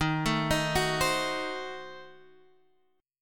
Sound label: C Diminished 7th Eb, chord